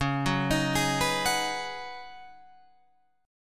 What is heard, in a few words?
Db 7th Suspended 2nd Suspended 4th Db, down, Guitar Open F; Db7sus2sus4; arpeggiated